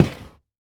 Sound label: Foley Footstep Golf Cleats Skid On Hollow Wood Surface; Foley Footsteps